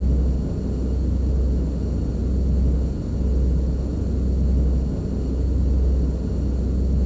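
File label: aircraft atmosphere; Total Control - Action Cutz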